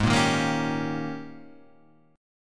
Ab Major 7th Suspended 2nd Suspended 4th Ab, down, Guitar, Half Step Down